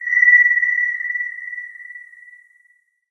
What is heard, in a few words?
amb rand abstract hi; video games; ambient; random abstract; source engine; portal2